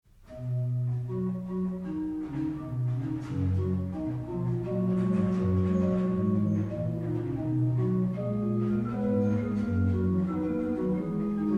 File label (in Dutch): trompettes, orgel; Neerloon; Barbou